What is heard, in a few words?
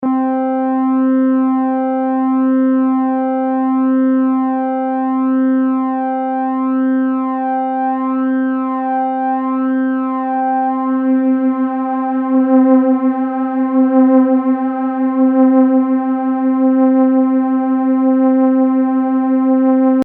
chorus